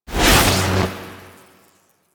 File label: Focus Cloak Pulse; Powers; Warframe; Operators